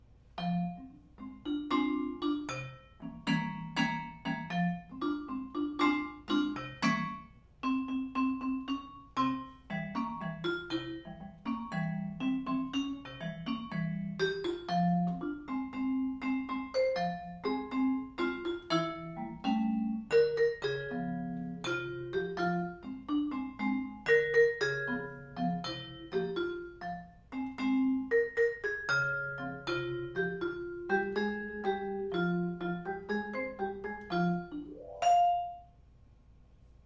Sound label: Happy Birthday, vibes